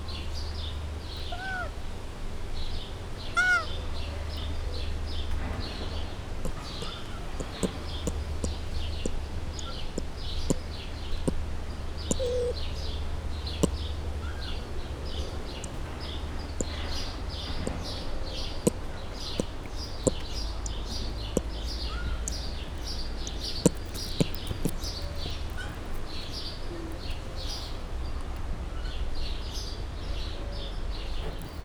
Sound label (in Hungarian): jobbról balra mozog kummog, gyűrűsfarkú maki; gyori zoo 2014